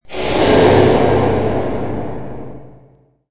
metal; amb elements

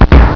cannon; modern warfare 2; super-mario

